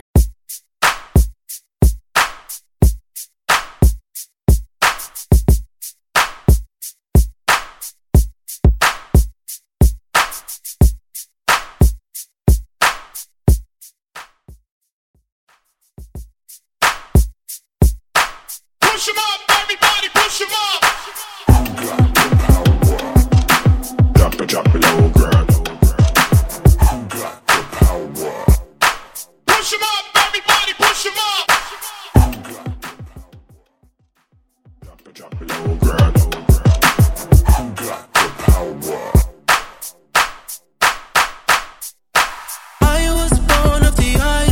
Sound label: Trap; Trap RMX; Mainstream